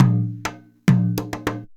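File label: junjunba groove; Best Service; World Colours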